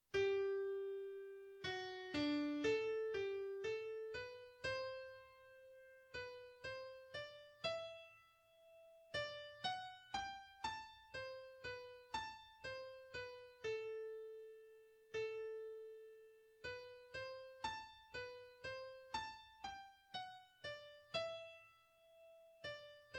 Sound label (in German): Menuet al Roverso Musik Palindrom